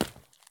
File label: sprint asphalt; Escape from Tarkov